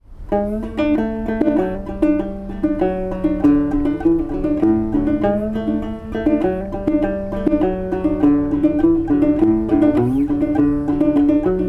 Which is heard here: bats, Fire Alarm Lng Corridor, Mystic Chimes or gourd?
gourd